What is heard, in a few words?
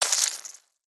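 spider sound; pauk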